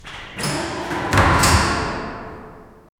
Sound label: sliding metal door heavy; architecture